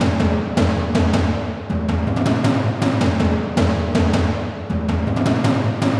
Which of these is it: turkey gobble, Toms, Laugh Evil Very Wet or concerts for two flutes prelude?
Toms